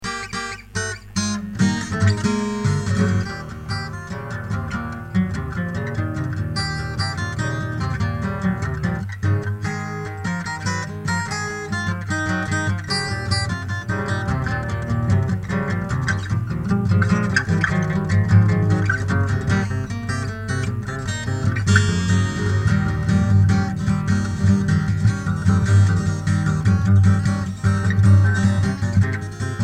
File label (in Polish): dwie gitary blues